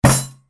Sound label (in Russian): звук удара по столу